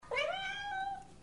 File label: miau